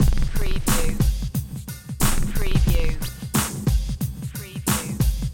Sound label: Hip Hop Drum Loop 90 BPM - Swing Without Crash; lynne-publishing